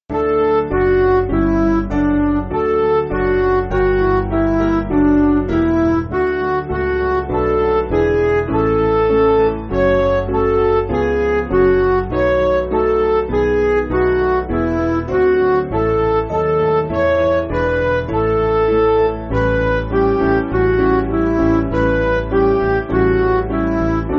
Music Backing Files for Churches

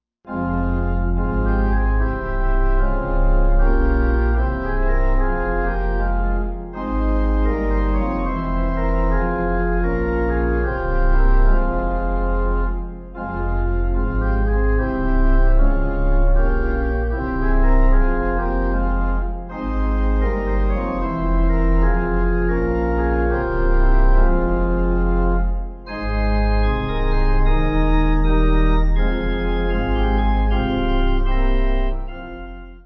Through The Night, Pipe; Ebenezer

